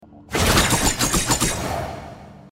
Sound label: sword hit; risk of rain 2